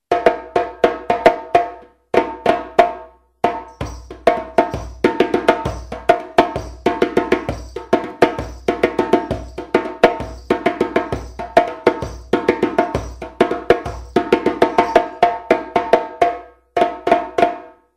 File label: Abondan, djembe